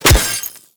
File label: Melee Staff Impact Robotic Flesh; Warframe; Staffs